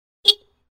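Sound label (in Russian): сигнал мопеда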